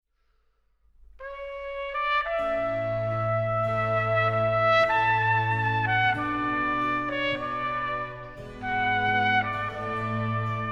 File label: Concerto in D major, Andante